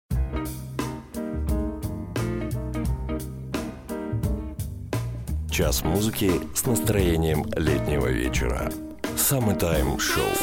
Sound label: Jazz